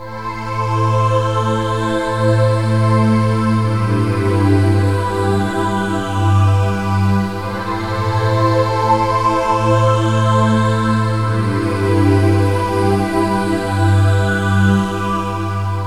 Phase pad

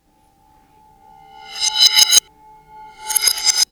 Horror Knives

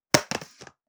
Item Drop Plastic

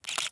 Camera Flash

